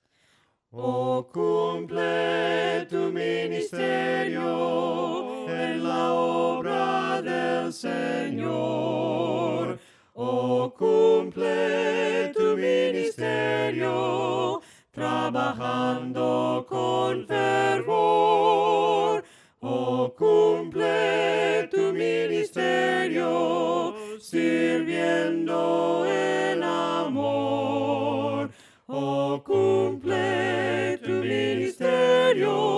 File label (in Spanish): voces; OH CUMPLE TU MINISTERIO; cantos del camino